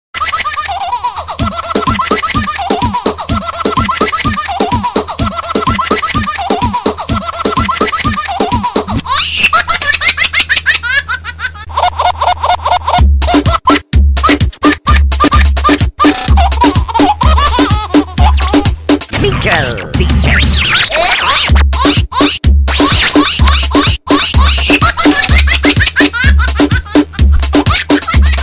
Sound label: Baby Laugh Remix